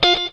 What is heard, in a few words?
Gtr Up Stroke; Proteus 2000; Multisamples; Digital Sound Factory Proteus 2000